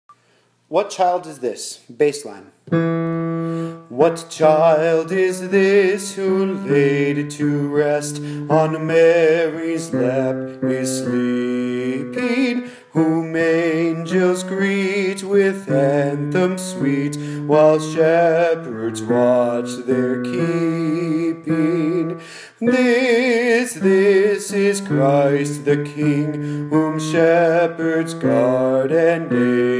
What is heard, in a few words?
bass